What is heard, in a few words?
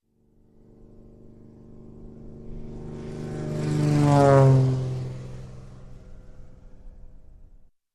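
Fast Turboprop Aircraft passing by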